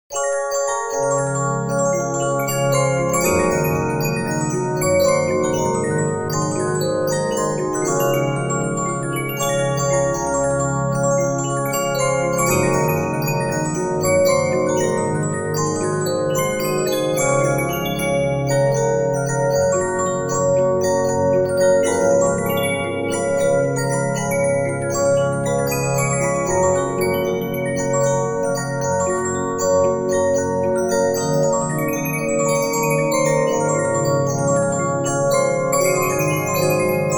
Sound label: Regina, 15inch disc musicbox